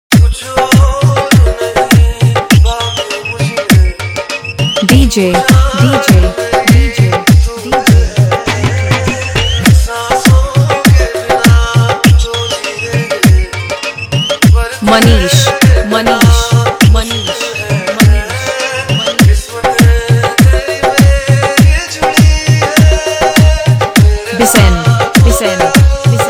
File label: Hard Bass Dholki Mix; Ek Villain Returns